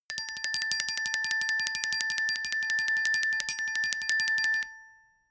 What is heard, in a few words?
glockenspiel tremolo low octave